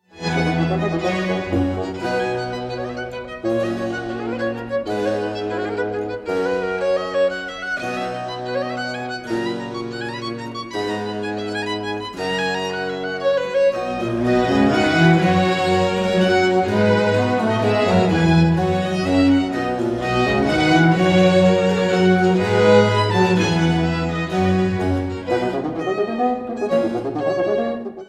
rondeau for bassoon violino piccolo strings bc in c major